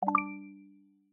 navigation selection complete celebration; Primary System Sounds; Material Design

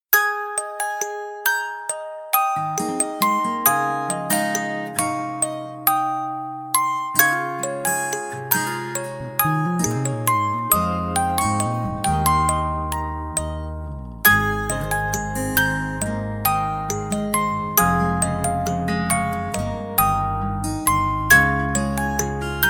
Musicbox

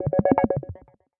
Radio Echo, notifications; Honor Magic 8 Pro

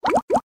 Unlock, ui; BenQ B502

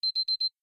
Timer Beep, alarms; Honor X7a

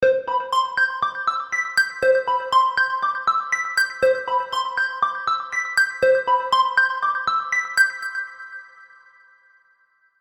Cool Lead; ringtones; Lenovo; ZUI 2

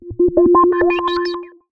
Notification

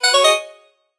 Blip; KDE; Plasma-Mobile-0.1

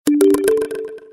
Alto Tones, notifications; Infinix Hot 12 Play

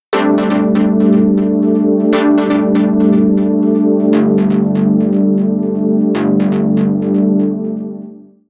Crystal, ringtones, Gigaset; GS290

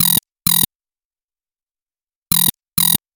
frogs, alarms; Nothing OS 1.5

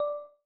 VideoRecordEnd; OnePlus One

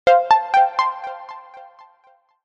chant; BenQ B502; notifications

